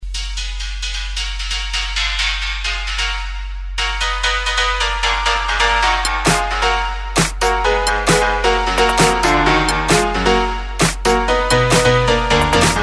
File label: Electro static